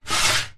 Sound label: money coin slide on metal table; foley; zapsplat